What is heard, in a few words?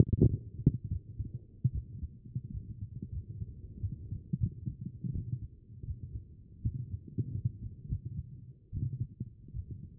HEART B MODE; cardiac